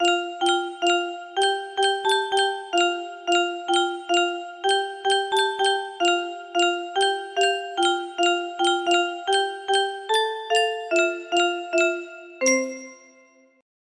melody

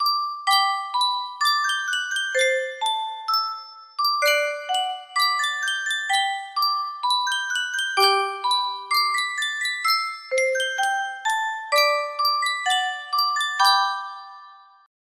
Yunsheng Music Box, music box melody

